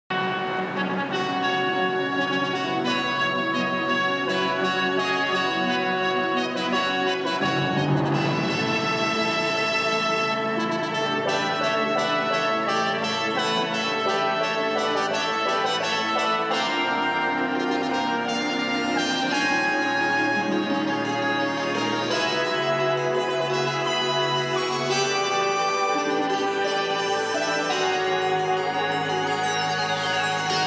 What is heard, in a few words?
fanfare